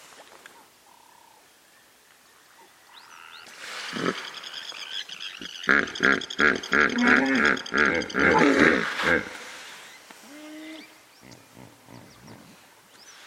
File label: hippo sound